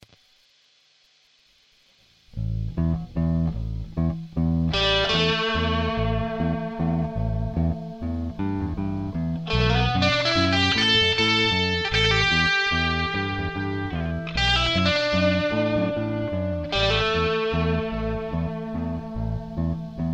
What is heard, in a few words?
bass and guitar